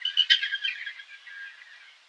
Birds Various, Birds

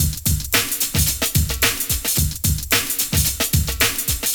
Drum Loops

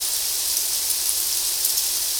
rain; Rain & Thunder; SFX

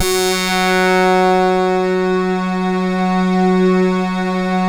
KORG DELTA, LEADS

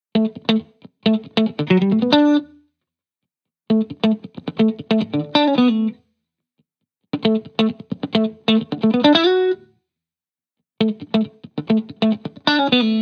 Guitar Bpm135 Key D, 70s Guitars; Fox Samples - Back to the 70s Guitars

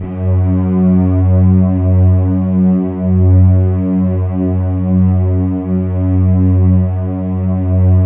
Keypad; Standard Instr; Keypad 2

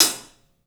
HAT, CLUBHAT, 3D Drums; 3D Sound

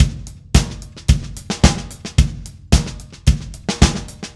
Drums, Indie Rock; Session 01 - Brighton; Sonic Foundry (Sony Creative Software); Indie Rock Anthems

